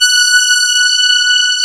CMI ORGAN; Wizoo - Powered DX

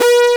AN SYN, SYN & LEAD; SAMPLE WAVE MIXING 2

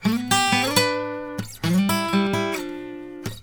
Blues Guitar; ILIO; Fretworks; LICK

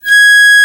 Piccolo p G#4; Digital Sound Factory Virtuoso

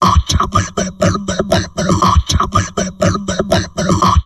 TALK, Drum Loops Crazy Processed

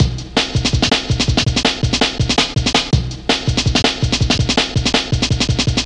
164 Insects, breaks; m8 breaks

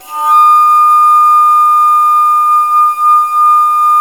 BASS D#, bass flute; USB Soundscan vol.23

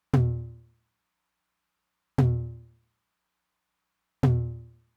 Low Tom, Roland TR-909; Roland TR-909 Ableton Project

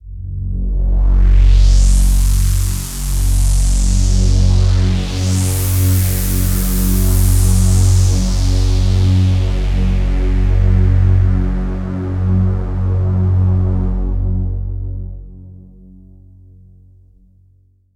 Deep Sweep Pad; Pads; Dr. Fink's Funk Factory

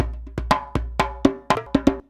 119 DJEMBE, Modern Percussion Loops

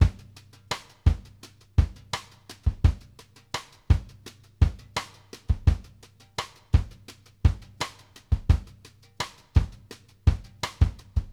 BRUSH GROO, BALLAD 84; Sampleheads; New York City Drumworks VOL-1; SP BALLAD 84

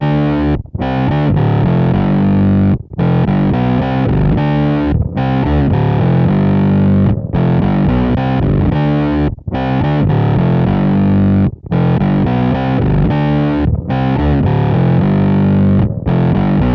Bass Guitar Loops

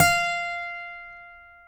ACGT F5, ACCGTR PICK

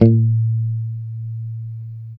B3 sus, Strat guitar; Spectrasonics; Hans Zimmer Guitars VOL-1; JC-STRAT MTE

